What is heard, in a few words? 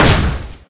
Blow; Life of a Naomi